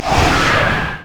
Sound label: ult swirl; Xin Zhao; zhaoyun